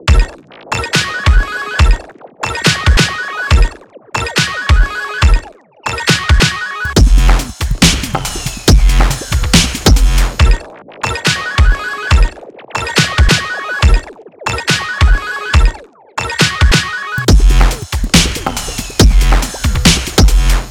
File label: Trippy Chippy Loop